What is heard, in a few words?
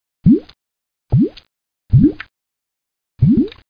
BUBBLE; HLhunger